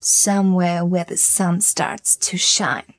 f mel g, fem Vocals

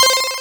Synths Root C; Synth Shots and Basses; Vengeance - Essential Clubsounds Vol.4; Note C

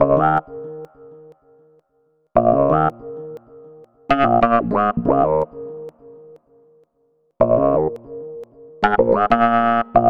Melody, Hip-Hop

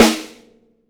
YAMAHA SNARE

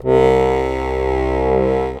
DIATON BAS, AKKORDEON, Alpine Volksmusik

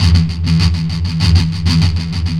Riff, Industrial; Nasty

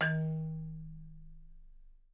D#2, percussion; Miroslav Vitous - Percussion & Harp

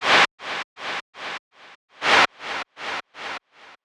Gated Noise 120BPM; musicradar

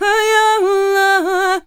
MEH1 VOX, Balkanish Traditions

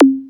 PERC, Techno 138 BPM; Best Service ProSamples vol.54; AKAI

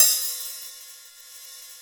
LITE OHH; Big Fish Audio; Synth City

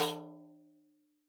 RIQ RIM; SoundBytes - Early Patches

